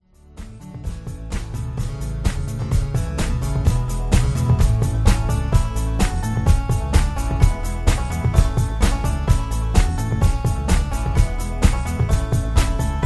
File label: Patter